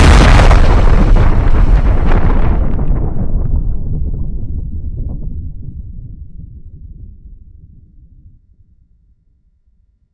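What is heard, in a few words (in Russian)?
konets tsivilizatsii, zvuki atomnogo vzryva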